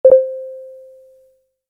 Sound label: console control